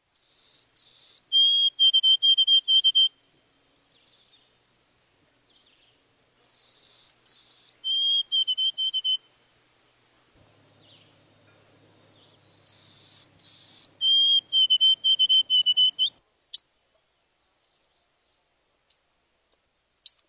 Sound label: White throated Sparrow